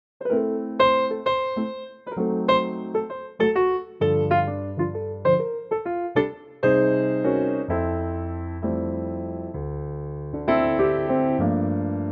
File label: F piano; key - F - vocal range - F to F